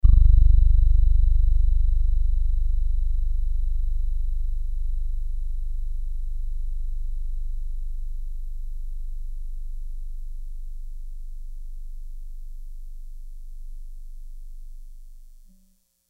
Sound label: Piano G plus 4